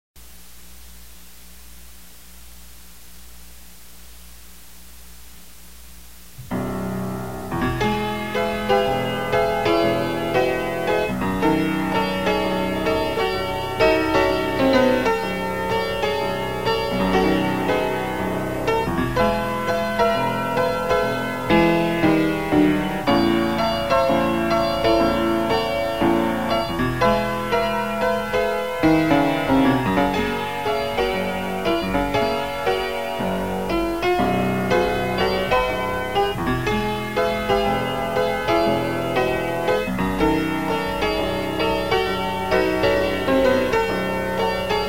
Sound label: PIANO